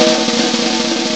ROLL, DRUMS; M8; CMI Factory

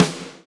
SD Real, Roland; Sound & Vision - Gigapack I

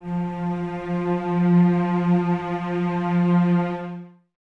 CES F 3, Cellos, String Ensembles; Miroslav Vitous; 90 s Sample CDs

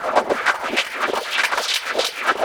Echo Chuff Splat, Synthetic Pulses

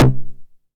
909 SD Tape Sat

